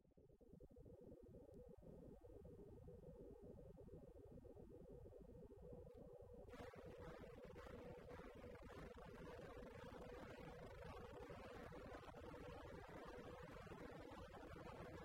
Frequenzmodulation

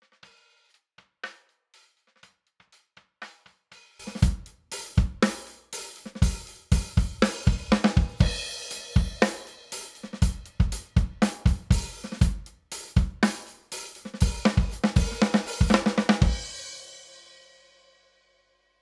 Drums Snare leiser

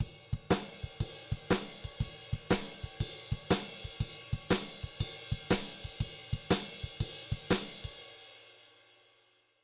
RND Portico 551 Drums HF 16k 0 bis +15

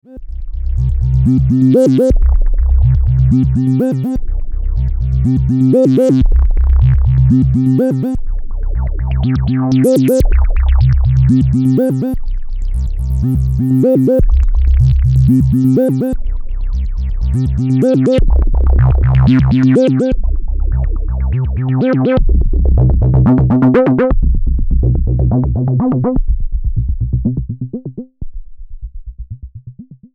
Vermona 14 Sinus VCO mit Mixer Drive und Filter Reso

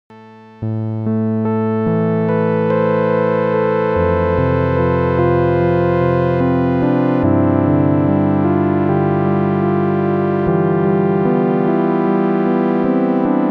Juno Sägezahn Melody

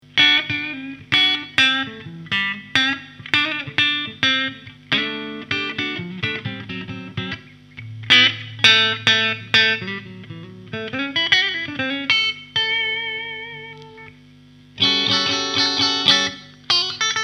Singlecoil clean Hals Mitte Steg; Ibanez RG5320

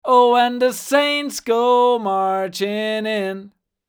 Gesang 414 HiZ Neve1073 DPA

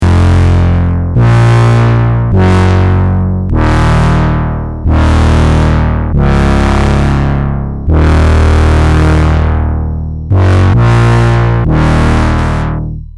FETT MOOG

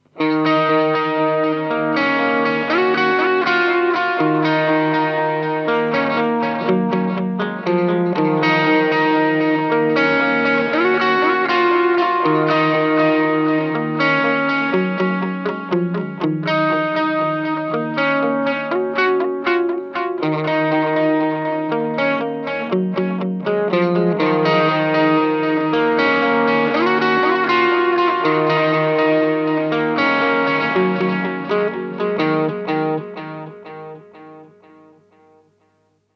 Boss SDE-3000D mit Overdrive

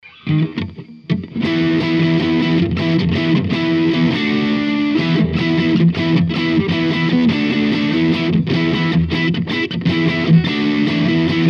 EQ Mitte Strat Hals PU More Crunch Yamaha

